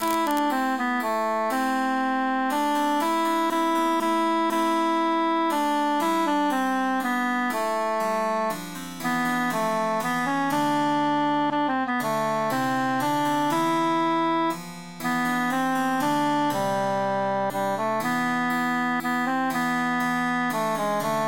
cantus emphasized; son me regarde prennes i garde he mi enfant

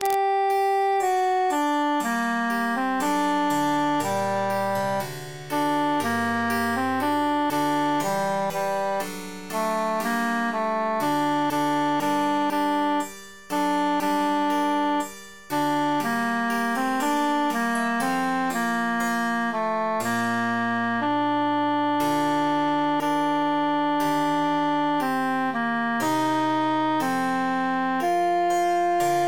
tenor emphasized; adew sweet amarillis

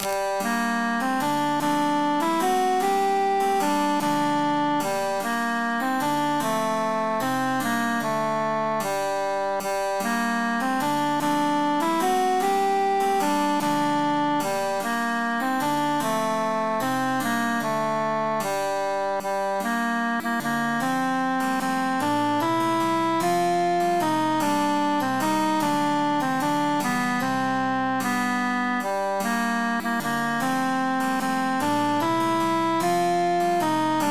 three country dances cantus emphasized

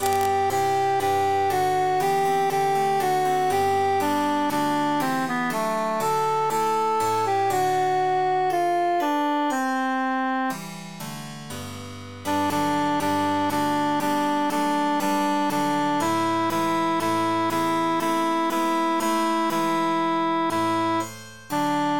altus emphasized

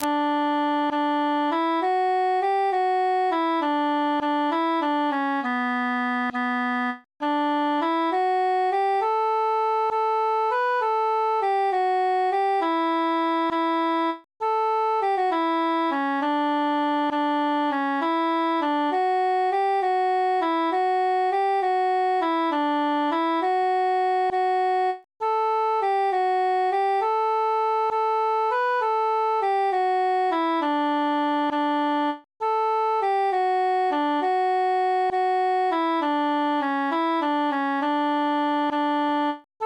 transposed altus solo

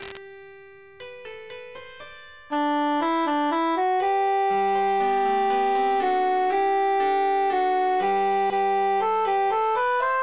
how merrily altus emphasized

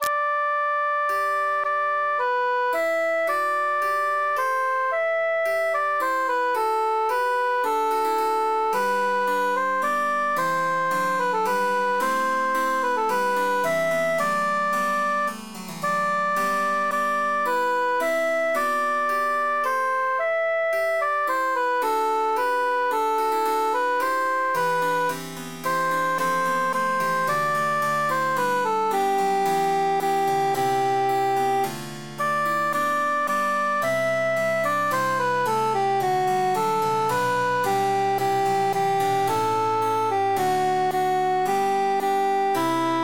cantus emphasized; jubilate deo

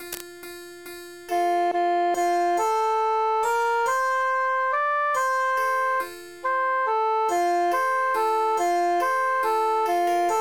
altus emphasized; in dulci jubilo

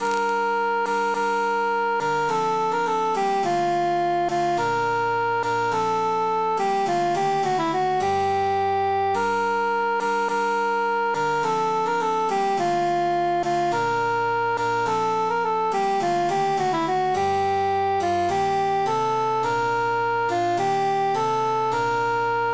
cantus emphasized; pastime with good company

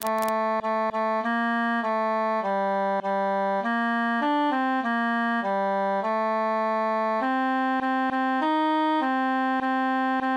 tenor solo; matona mia cara

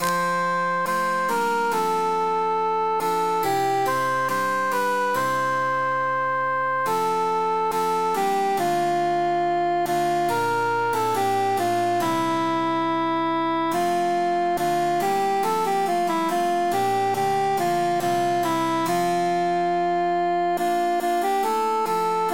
cantus emphasized; tant que vivray

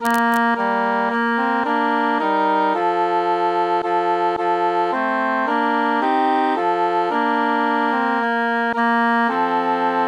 altus mute; this evenfall tis snowing

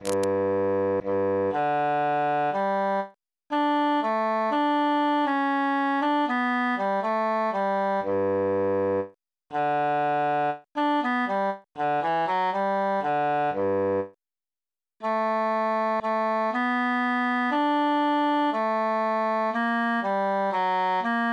transposed contratenor solo; se la face

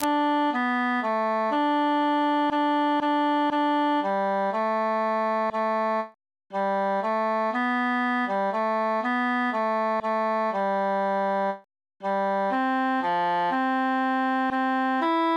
tenor solo; sing we and chant it

